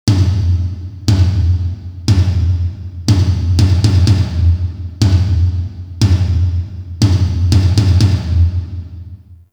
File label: Stadium Sound Check Drums; Warframe; Ambience; Nineteen Ninety Nine